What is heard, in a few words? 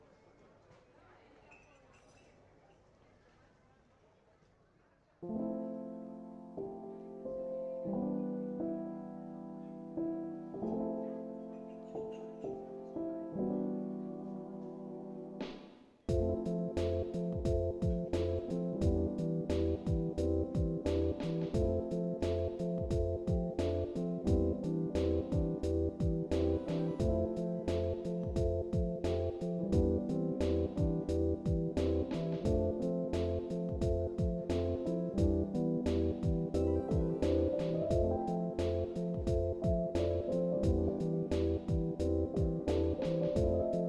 delay lofi